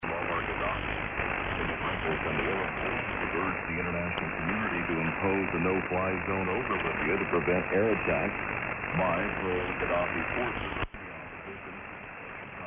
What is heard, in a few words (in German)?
Kurzwelle 49m 5835khz